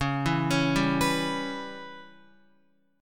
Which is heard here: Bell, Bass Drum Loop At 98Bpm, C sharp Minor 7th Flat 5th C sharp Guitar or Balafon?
C sharp Minor 7th Flat 5th C sharp Guitar